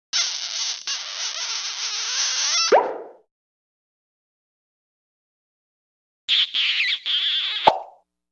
Kiss + pops; The Look Back SFX

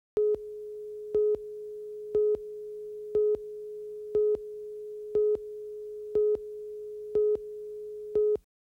countdown; PBS Wisconsin

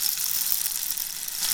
African Rain Stick Pou

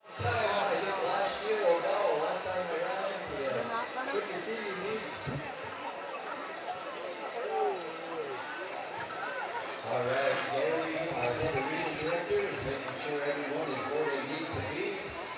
powwow MC voices jingles; Menominee PowWow 4 Aug 2023

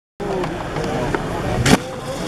landing; EAU CLAIRE HTS; THOMAS NAUNAS

